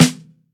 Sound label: round snare drum b key; snare drum samples